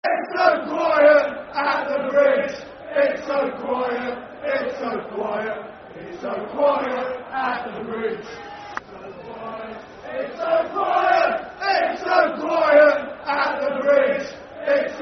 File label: Football Chant (Soccer Songs); It's So Quiet At The Bridge; Fulham Ffc